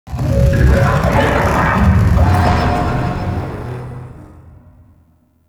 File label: Search Lights Growl; Gameplay; Mission One; Warframe; The New War